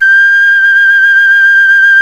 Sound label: KENA G3; The Andes